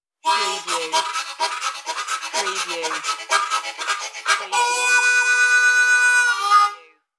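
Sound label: Harmonica Train Riff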